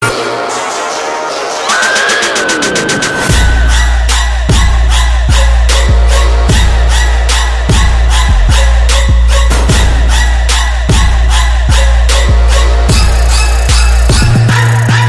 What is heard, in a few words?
Halloween Trap